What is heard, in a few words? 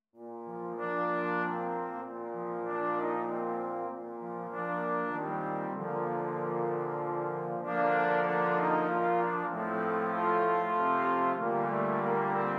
Trombone Quartet